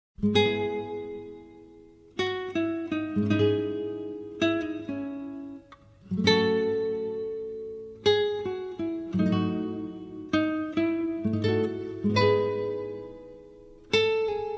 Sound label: guitar